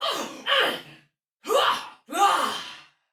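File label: Death Fire Soldier Female; Dialog; Barks; Battle; en; Sinecure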